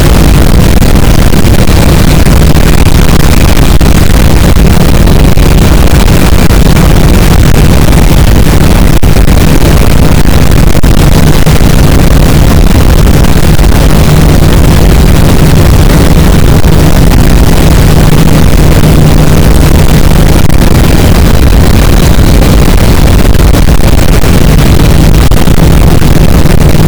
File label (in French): cachalot positif, boat; Physeter Macrocephalus